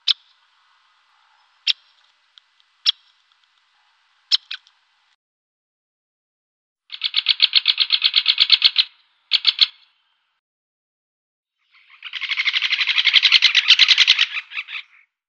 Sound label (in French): Pic épeiche, cris souvent plus espacés ou bien en rafale; ATLAS NICHEURS BRETONS 2004-2008; Cris du Pic mar